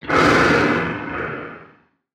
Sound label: FRACTAL GUARDIAN death; Legacy